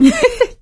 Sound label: laughter, survivor voice; Left 4 Dead 2; producer